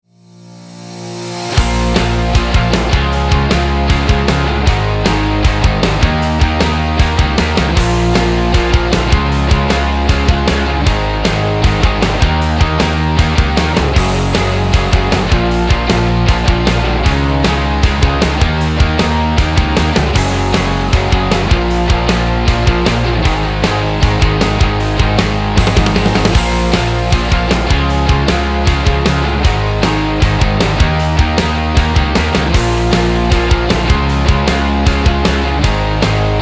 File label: Dirt Track; Dirt Track Short Version